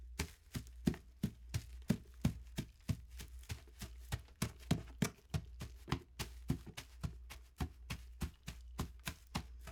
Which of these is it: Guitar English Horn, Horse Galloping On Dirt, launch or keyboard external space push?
Horse Galloping On Dirt